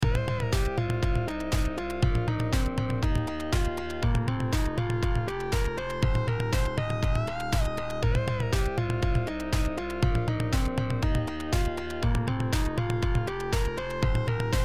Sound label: bass basstone lead sound beat